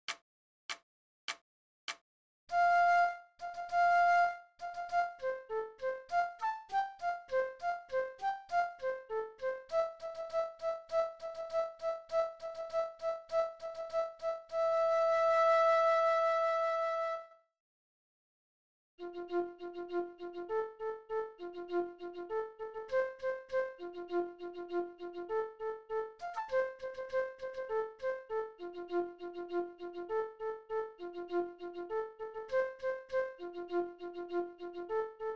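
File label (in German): Floete, 100 bpm; Wilhelm Tell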